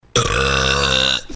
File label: beurp